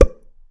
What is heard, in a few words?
drop